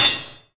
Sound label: axe; kult